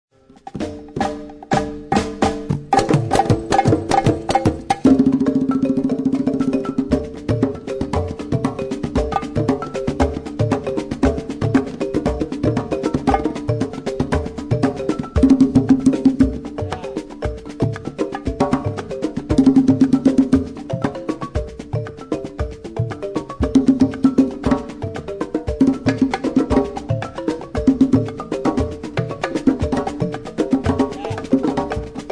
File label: balafon